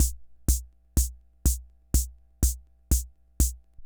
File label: Ace Tone Rhythm Fever Loop